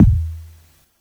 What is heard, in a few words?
long tail reverb steel kick drum sample e key